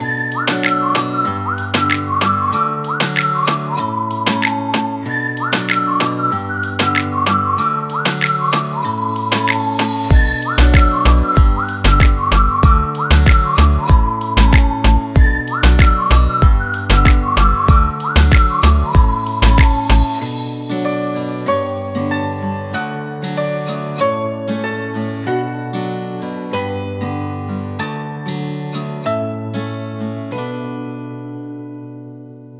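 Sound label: ispanyol latin reggaeton; By Burak Sound Bank; latin ispanyol tema vol 3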